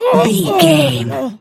voice female death

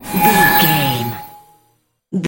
magic shine light spell

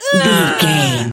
voice female effort push fast

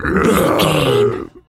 zombie voice grunt

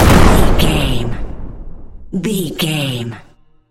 explosion large

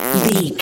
fart squirt

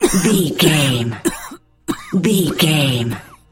voice male cough long

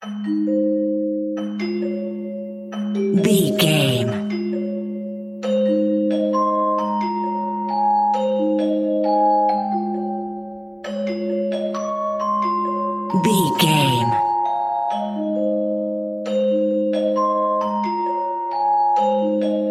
celeste, French Celeste; Rock A Bye Baby French Celeste

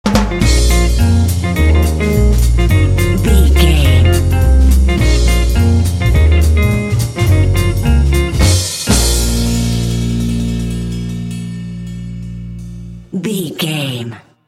jazz django swing sting

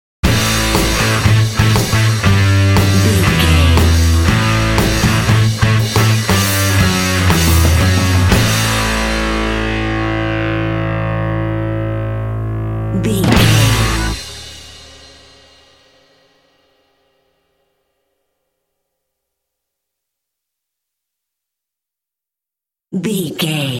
noise god sting

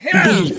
voice female attack

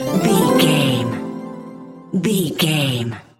point sticker bonus score reward jingle

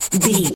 voice fun small creature sniff

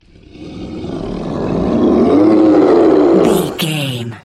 troll monster growl

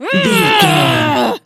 voice female growl

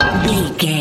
manhole metal door lid impact

